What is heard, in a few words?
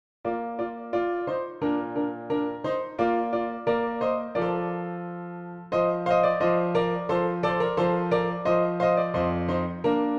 Dance PianoTeq Bechstein; Dittersdorf 12 English Dances